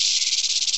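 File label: parasite attack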